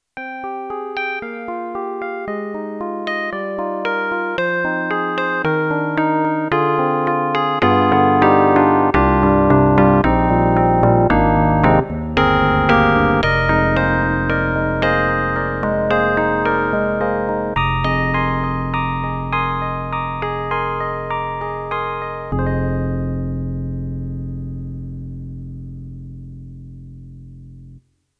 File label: Yamaha MU1000EX Phunky DX